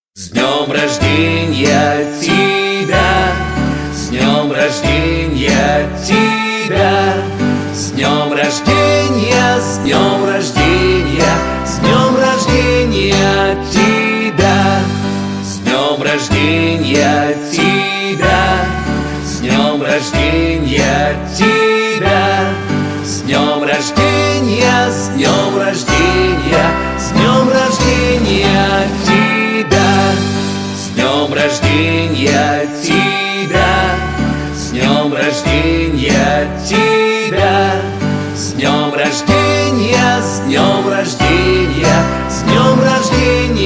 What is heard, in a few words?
happy birthday russian